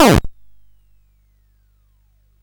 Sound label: Frantic, CSG8580R5